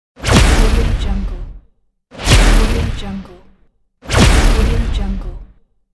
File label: impulse shot attack